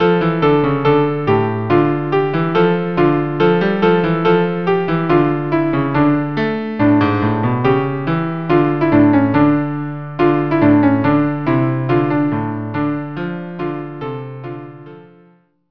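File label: violin cello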